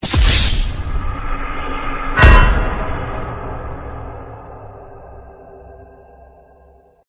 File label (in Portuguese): se fodeu efeito; gta v